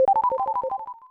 Maxed out; mother2

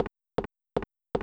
Stairs; mother2

